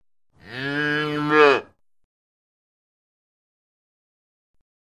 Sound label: Cattle, Cow Bellow. Single Calf, Five Days Old, Bellows. Close Perspective.